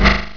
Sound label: Wrong; MacEmatics 1.1